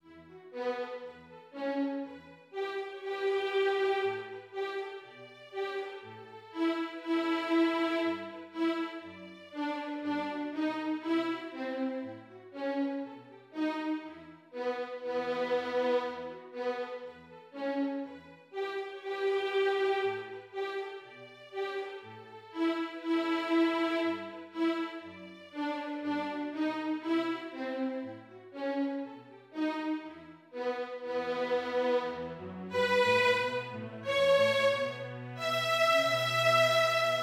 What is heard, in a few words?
Str Vln; Bartok